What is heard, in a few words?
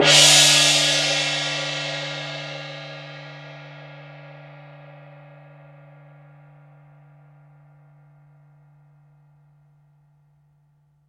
CYM SML, Orchestral Perc; Roland LCDP03